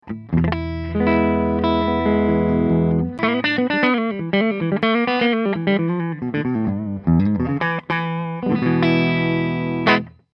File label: Amp Signal